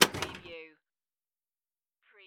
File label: Pinball sound, ball launched